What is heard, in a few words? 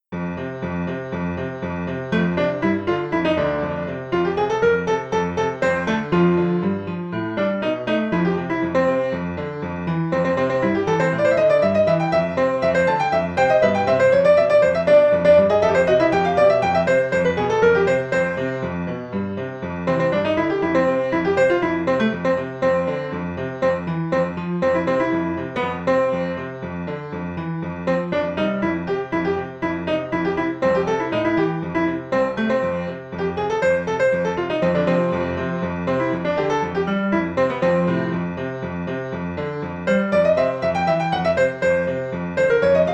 Piano Folk